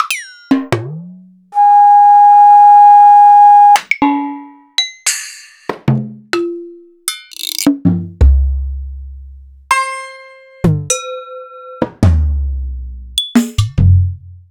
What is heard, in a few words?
EMU Planet Earth